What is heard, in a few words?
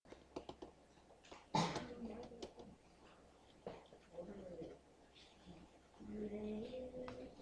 sea